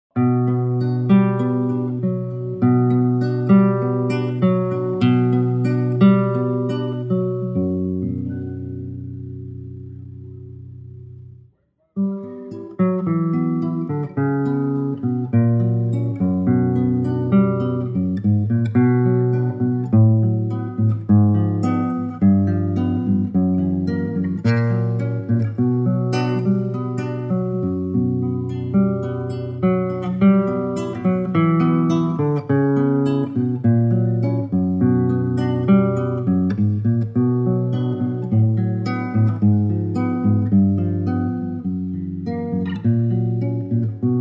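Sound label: Bm play guitar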